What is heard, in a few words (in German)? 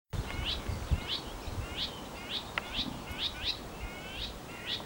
alarmcall dorngrasmuecke